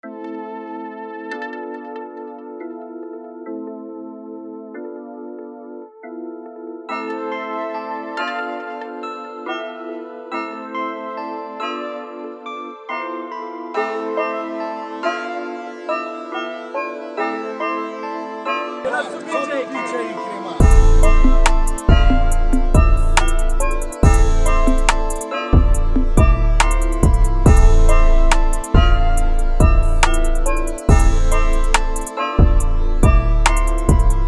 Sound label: x type beat